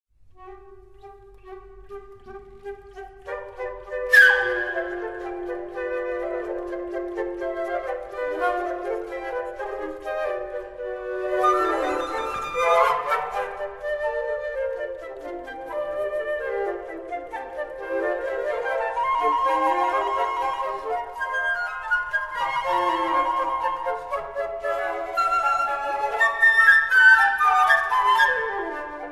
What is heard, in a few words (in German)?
Quartett für vier Flöten